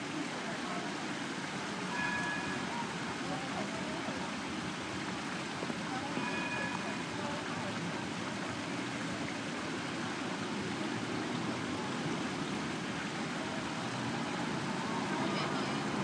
market square ambience

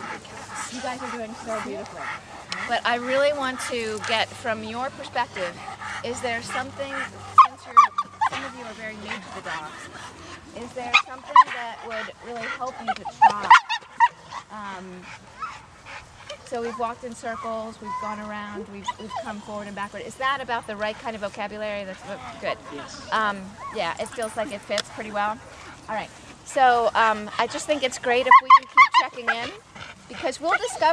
dogs

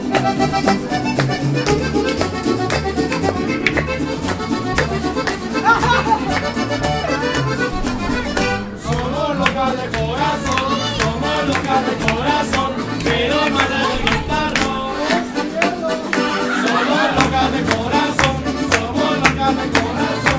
mariachi loco

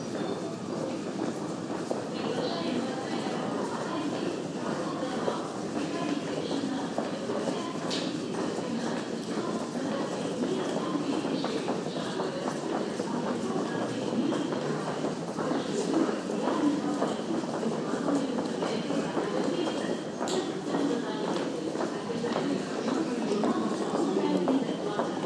sound of subway, moscow

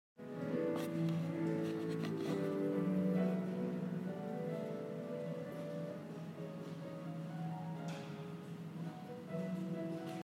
free music

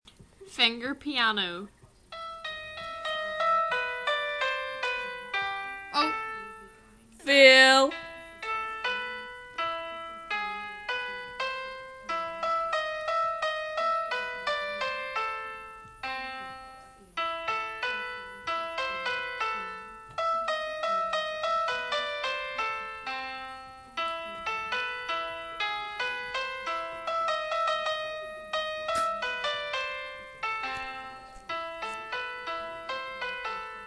iphone app finger piano in action

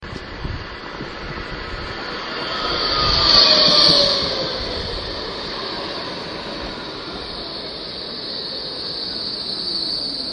tram arriving at station street

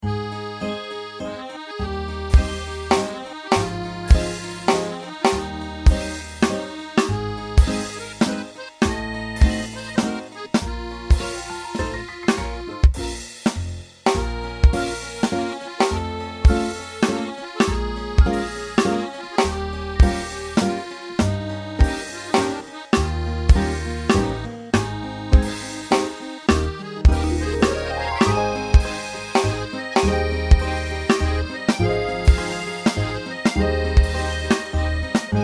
3:4 waltz

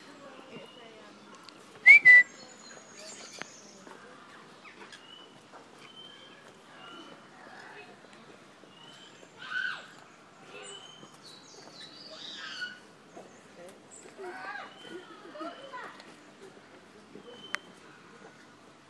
bird garden; hardwood house

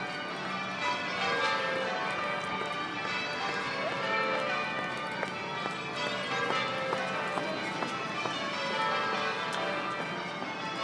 London church bells

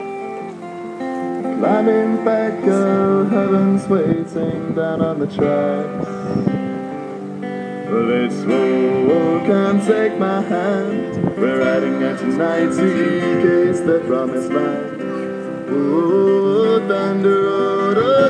busker; solihull

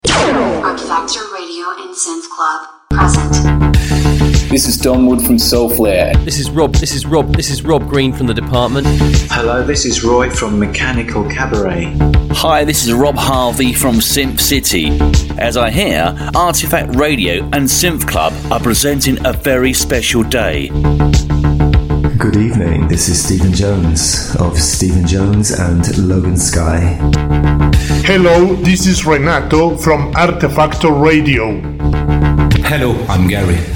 synth wave live; rtefaktor radio anniversary

